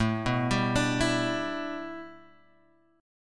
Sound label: A 7th Suspended 2nd Suspended 4th Guitar Open F; A7sus2sus4 arpeggiated